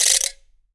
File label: RATCHET; Big Fish Audio; Ross Garfield Drums VOL-2